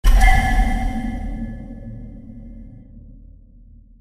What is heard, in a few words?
gameover; hangman